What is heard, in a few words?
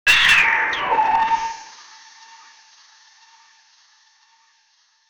cluck hen with electronic, chicken